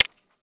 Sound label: elite taunt tap; csgo